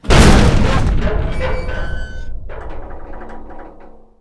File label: kickout; Mafie 1